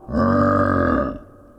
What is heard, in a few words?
groan, zombies